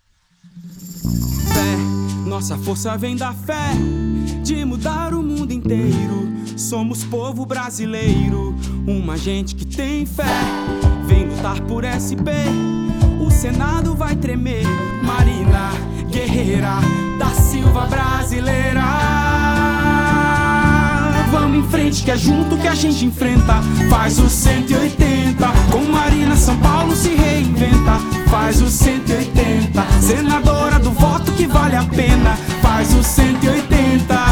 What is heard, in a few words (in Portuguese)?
jingle oficial; marina 180